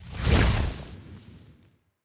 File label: Fire Sphere Attack; TANK; 3Souls